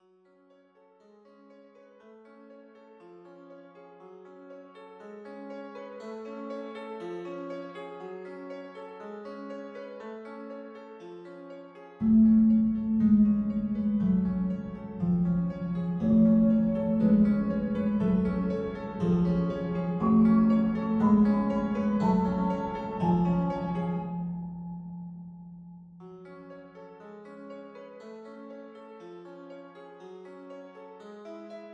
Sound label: Turkish March, Piano FX